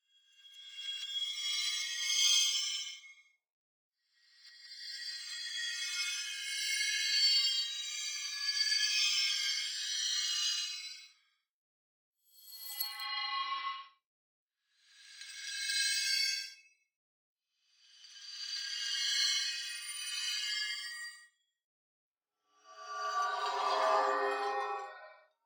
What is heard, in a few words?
Magical Shimmering Glass Crystals Compilation